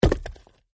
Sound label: unlock; Legends of the Hidden Temple - Unlock the Past